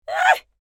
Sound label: Furious mature woman grunt; scream